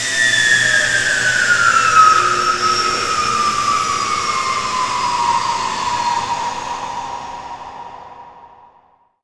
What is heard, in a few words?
space ship dock; jenquai; Earth and Beyond; EA Games